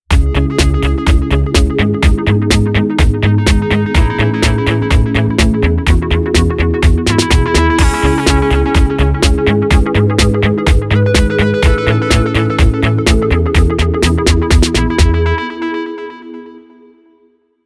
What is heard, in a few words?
ringtone; Oppo A3s